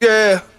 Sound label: "yeah", sung